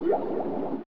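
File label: delfin, dolphin; The Wild Thornberrys Movie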